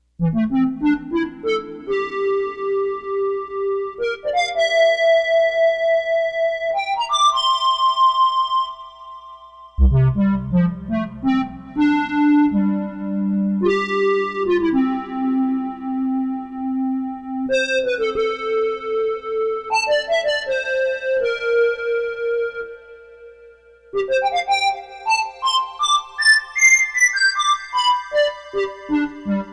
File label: ob4voice lead